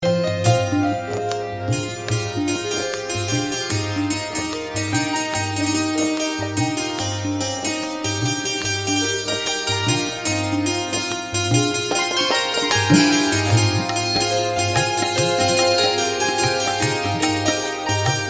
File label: Santoor Rhythm